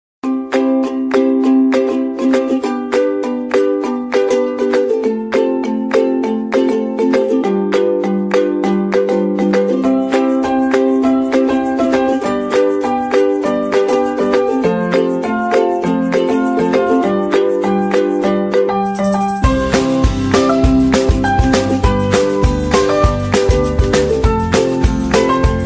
Happy Background

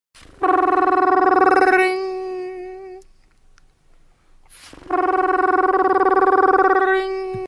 rrrrrrrring

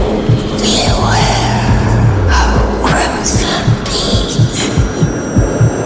Lullaby

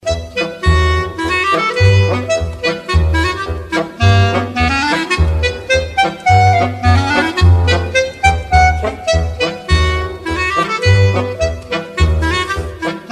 Wobble

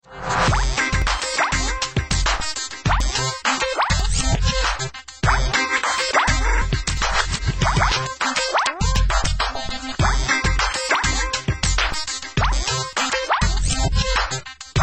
iphone, ringtones

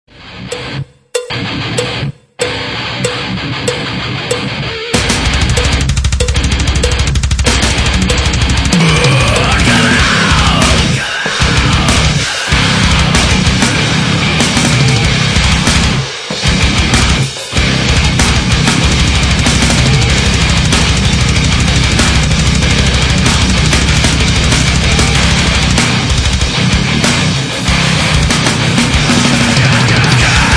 cow bell intro

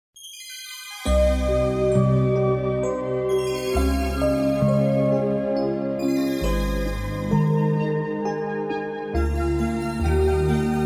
sms; ringtones

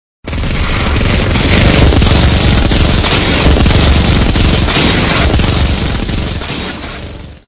machine gun

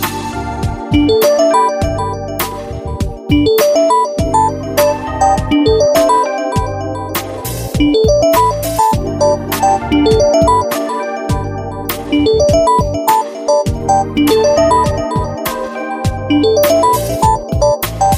harmony tone

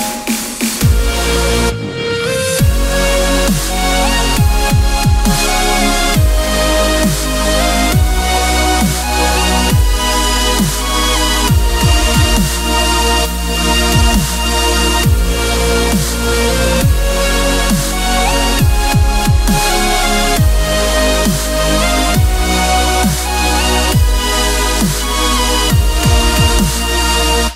remix

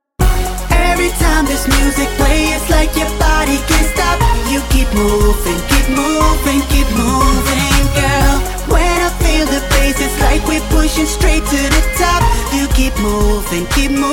Reggae

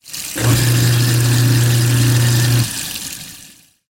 Garbage Disposal

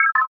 notification alert

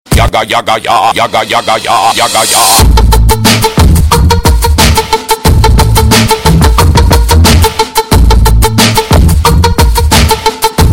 bass; jazz

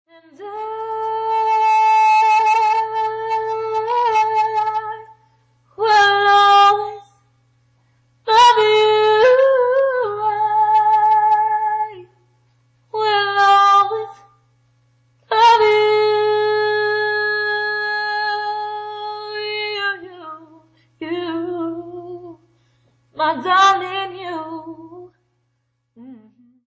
singing

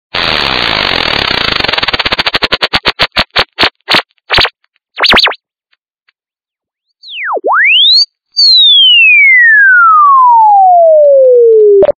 emo theme

